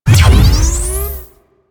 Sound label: Door Trip Laser Start; Objects